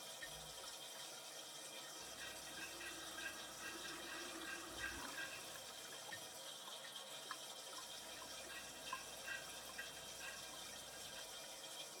Office Pipes; Silco

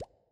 Bubble Large Pop

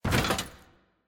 Gun Drop; Rhino

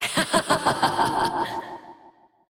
Enemy Boss Laugh; bloom minigame

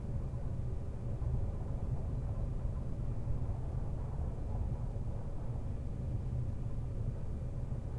Ambience Office

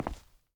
footstep; bloom minigame

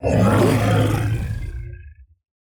Enemy Death; VO; bloom minigame

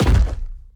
Enemy Footstep; bloom minigame

